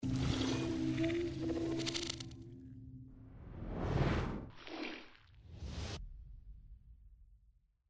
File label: logo intro animation